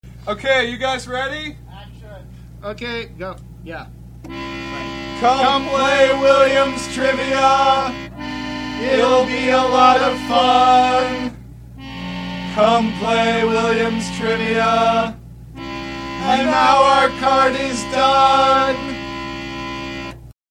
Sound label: bad singing